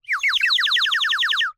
Bird whistle, clay, painted, water, airy, wispy, phrase, excited, protest, rapid; Eiravaein Works; Vaeyan IV